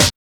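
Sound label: HSE SD, house snare drum; X-Static Goldmine 1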